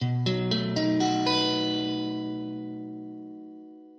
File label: B7